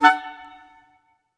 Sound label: D-A-F#-A, woodwinds chords; PowerFX; Downtown Orchestra